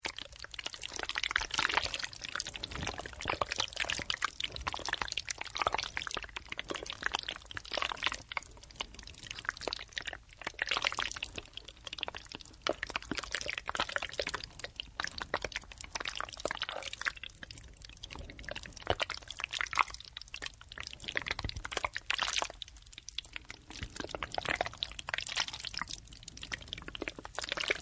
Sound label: Fat, Oozing, bubbly fat or other slimy stuff; Sound Design